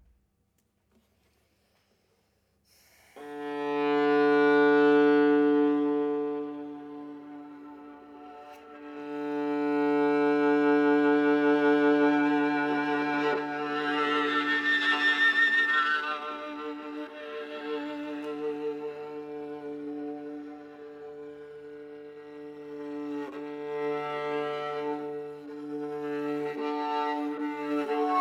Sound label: D minor improv